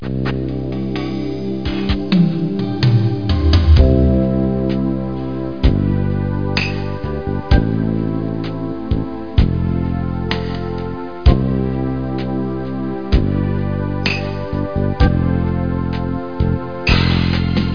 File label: blues